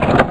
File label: bomb Latch; BOMBER III™ (DEMO)